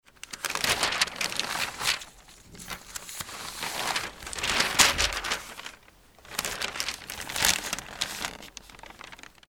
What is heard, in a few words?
newspaper